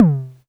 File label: polyvox tom, Polivoks; Formanta Radio Plant